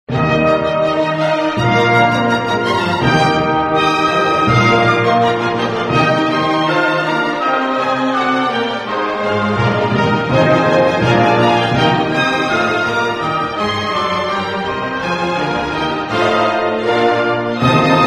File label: Mozart The Magic Flute